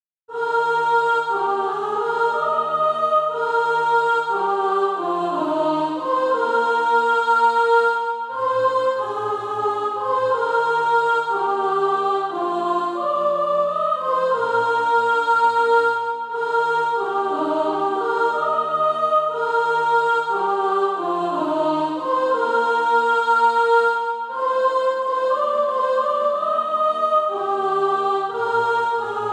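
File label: soprano, Practise Music; Crown Him with Rejoicing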